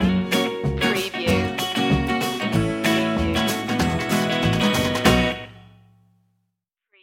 rhinestone rambler stinger

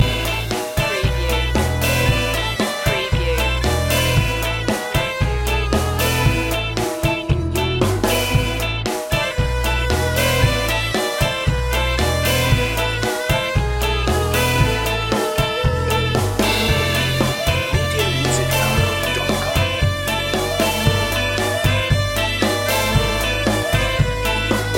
ska loop

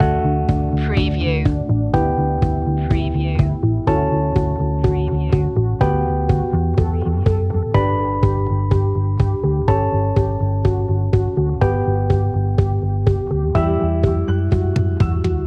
starting something new loop

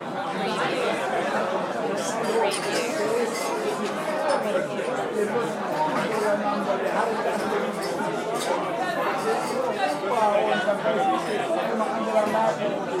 german pub ambience

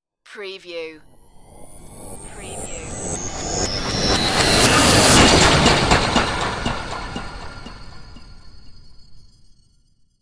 chaotic whoosh impact

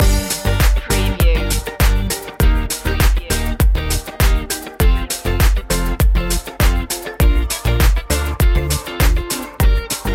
funky poptronic loop

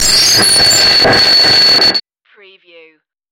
starship door error

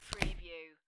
Playing Cards Deal Single Card; Turn Or River

